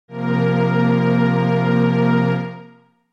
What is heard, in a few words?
Bb F Suspended4